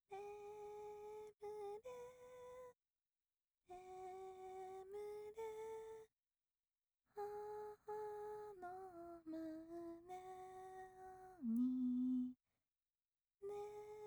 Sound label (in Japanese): 子守唄